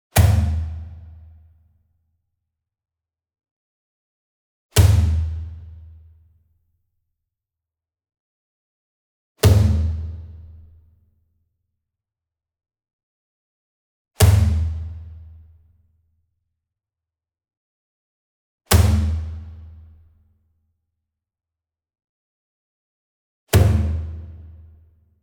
SINGLE DRUM Kodo Hit Whip Beater Medium, Cinematic Strikes; boom library; Cinematic Strikes Construction Kit